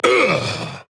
Grunt Hit; Drogoz; Dreadhunter; Paladins

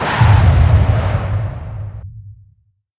Starstorm target layer; Dota 2; Pulsar Remnant

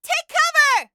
taking damage; Realm Royale; Starburst Sorceress

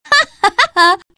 wind laugh; Dota 2; windrunner

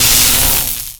Chain Lightning target; Harpy

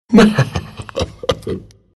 dragon knight laugh; Dota 2

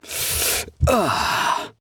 Grunt Jump

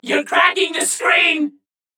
Taking Damage

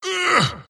wraith grunts; Skeleton King wraith

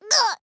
Death; paladins; Ying

